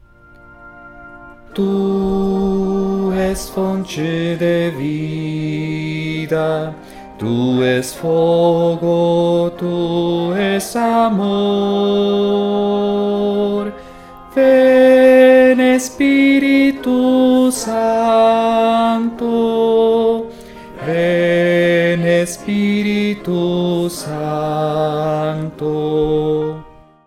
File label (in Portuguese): soprano oitavado